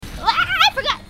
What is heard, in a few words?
waaah; IRyScream